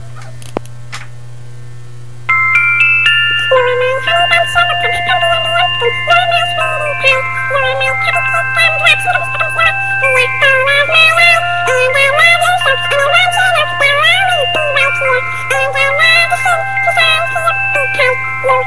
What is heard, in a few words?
chipmunck version